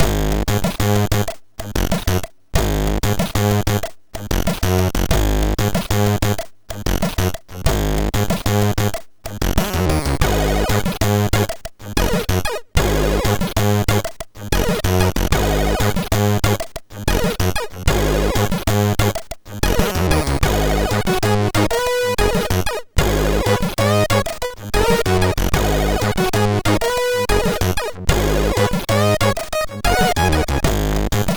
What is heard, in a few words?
disco intro, MOS6581R3